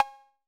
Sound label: Cowbell 808 Color Short